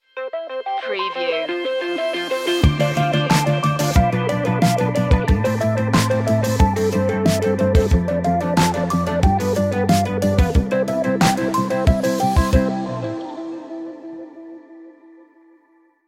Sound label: analog epic